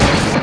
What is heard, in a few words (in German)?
aufsetz